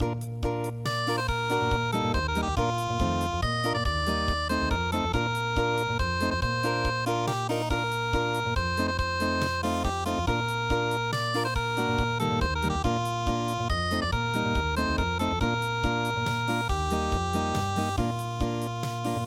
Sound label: unpluged